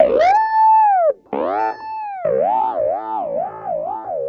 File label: Clavinet; Ambient Grooves